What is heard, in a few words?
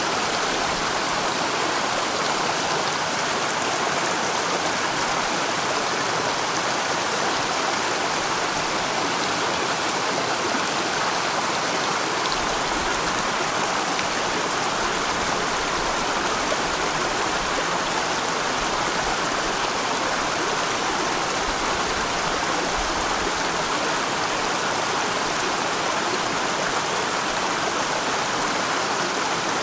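sea waves beating against the coastal cliffs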